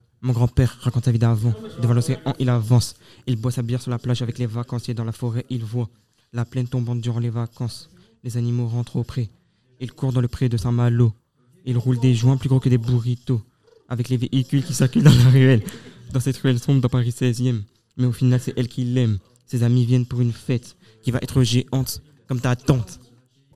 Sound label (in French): chante